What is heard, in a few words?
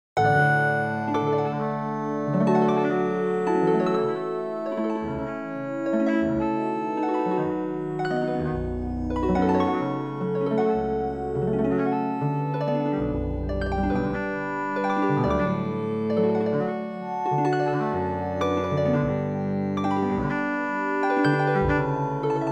Pachelbel canon chords